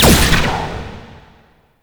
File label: Laser Explosion; Warframe; Tau; Sentient Dactolyst; Weapons